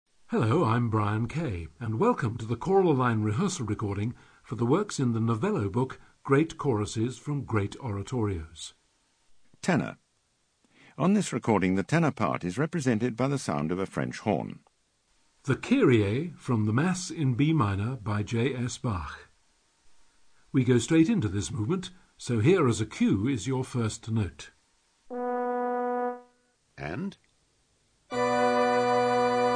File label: Tenor; B Kay Gt Choruses